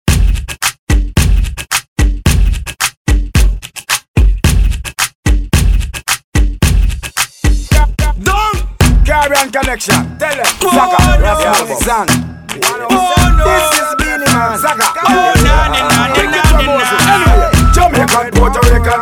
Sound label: remix bpm 110